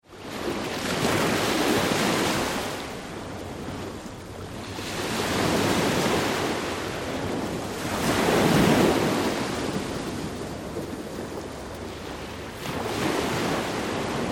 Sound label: Ocean Sea Medium Surf onto Pebble Beach; Close waves not much pebble movement